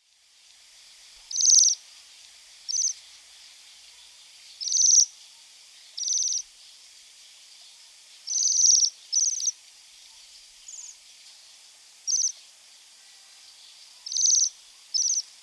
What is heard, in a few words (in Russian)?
sviristel v lesu